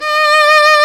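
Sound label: STRINGS SOLO, Chamber Grp; Roland L-CD702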